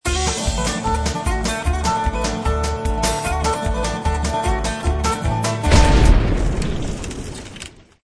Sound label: Opening Sound; Dynamite Digger Eureka; original dynamite digger audio